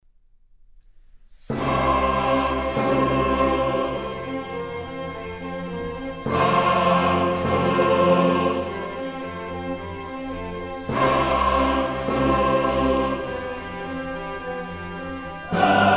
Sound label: Missa brevis in C Sanctus; KV258; Spaur-messe; Mozart; Missae and Requiem; Davis, Gardiner